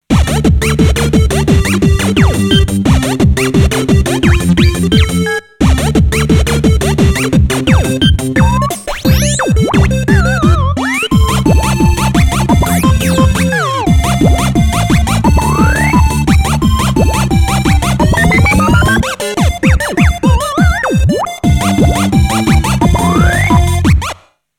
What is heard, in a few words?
Gunkan Aho Beat